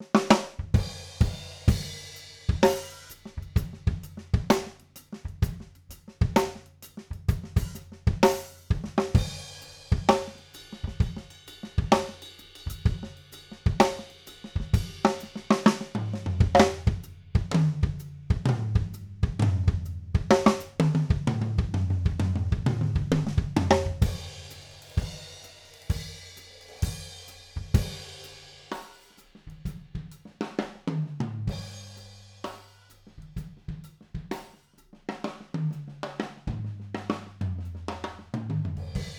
OH Spaced Fig 8, overheads, drum; drum shootout

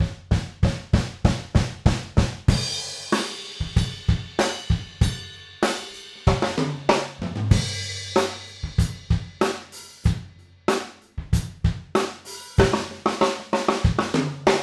Room Stereo Fig 8 Null, drum; drum shootout

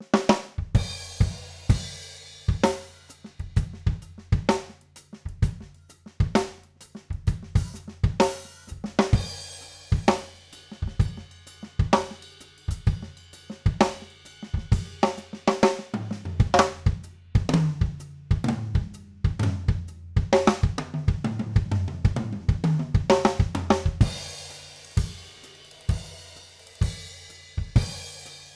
OH Spaced Card, overheads, drum; drum shootout

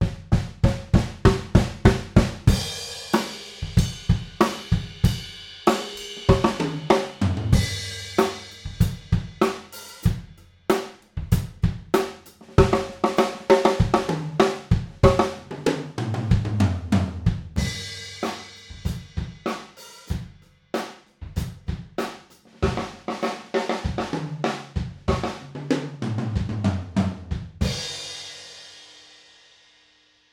Room Stereo Front Blumlein, drum; drum shootout

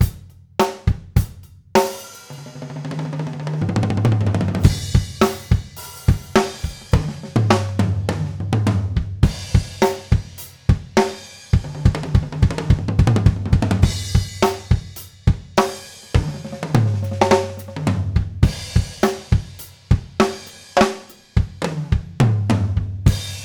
Toms, drum; drum shootout

